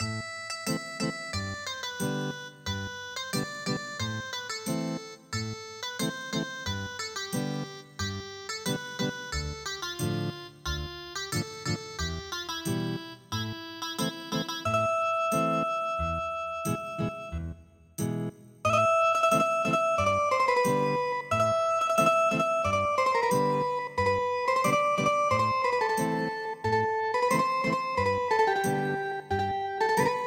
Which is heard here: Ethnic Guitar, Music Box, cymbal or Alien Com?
Ethnic Guitar